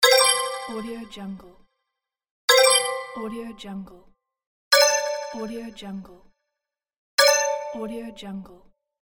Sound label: start button